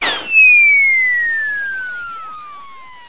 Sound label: Long Fall